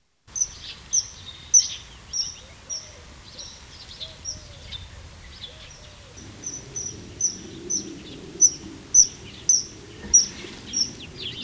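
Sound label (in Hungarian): Jégmadár; Madarak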